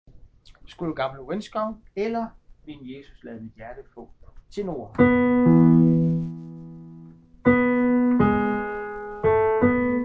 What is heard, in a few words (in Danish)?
tenor